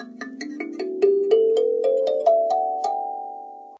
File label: stack appear